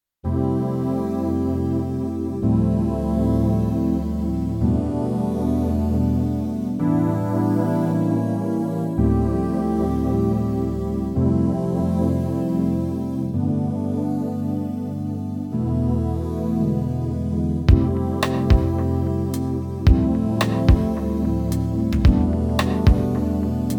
Ambi Pad TX816

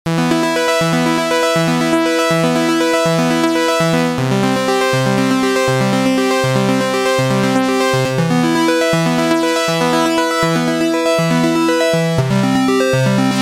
Omni2 osc1

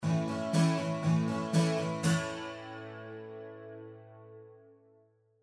PCM81 Chorus

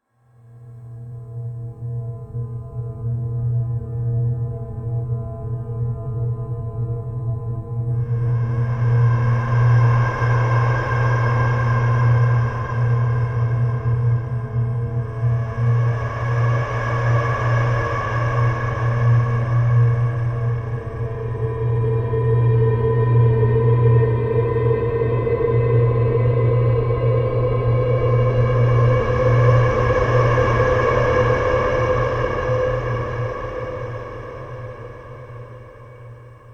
Sequential; Sequential Take5

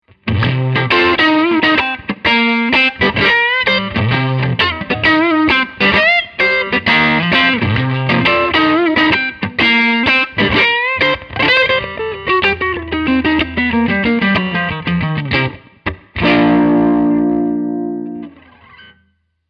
Crunch; One Control

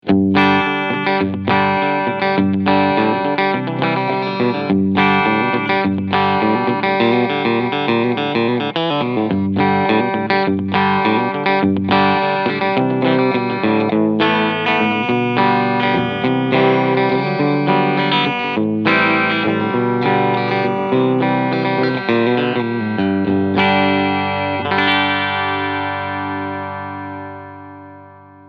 Fender SQ Bullet Tele Cleansound Steg PU

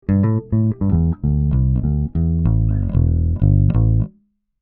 Midas 512 EQ DI E Bass 100Hz Bell 3Khz; 2 oclock

